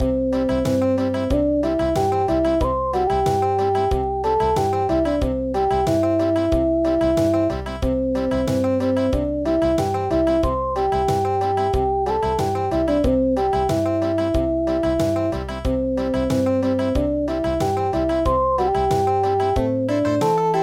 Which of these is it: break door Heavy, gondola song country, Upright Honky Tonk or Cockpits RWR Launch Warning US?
gondola song country